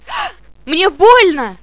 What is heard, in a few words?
hit, medic; svencoop; paranoia